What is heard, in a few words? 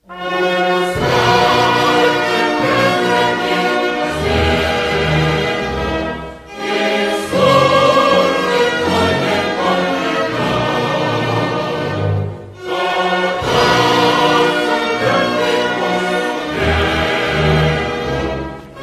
Internationale, Serbo Croatian; music